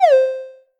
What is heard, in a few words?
pop close; rhythmbullet